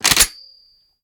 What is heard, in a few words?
reload empty xmag charge; gmod; viper